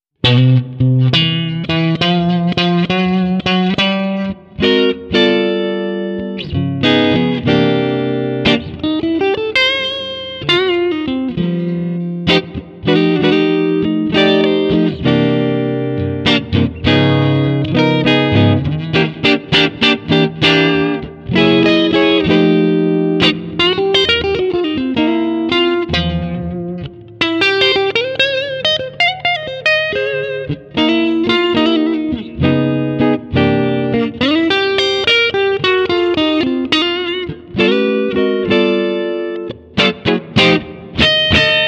blues mode; vox

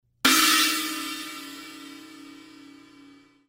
crash, zildjian

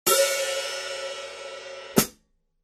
zildjian

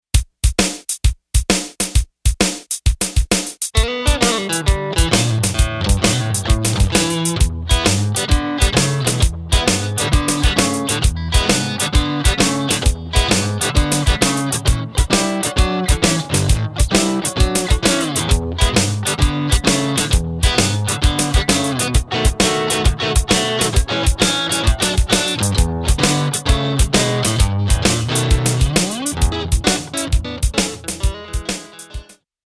tweed 4x10 delay; vox

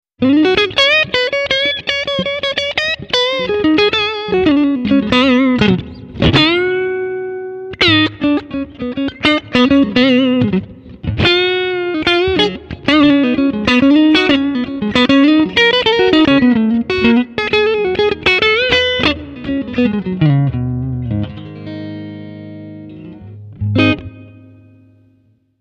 blues mode; vox

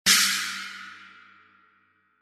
zildjian

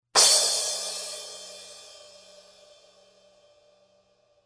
crash, zildjian

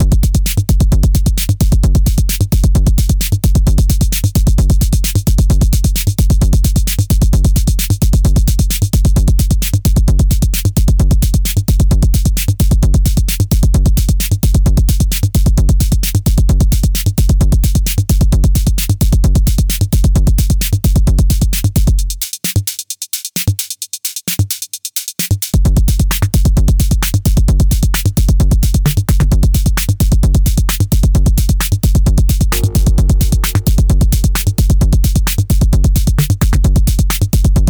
drumbrute impact; arturia

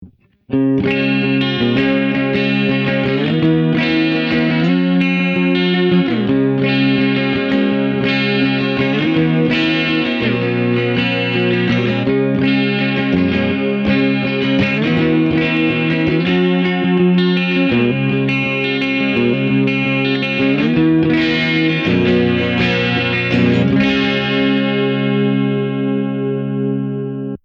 ac30 with chorus enhanced; vox

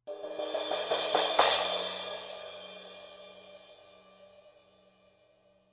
build crash, zildjian

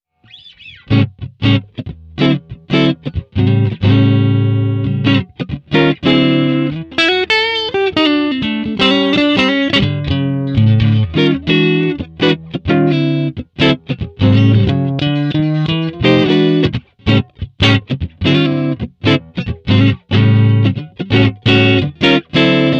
blues mode; vox

